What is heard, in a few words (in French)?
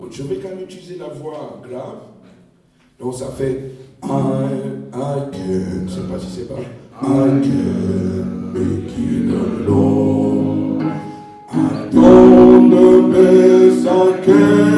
basse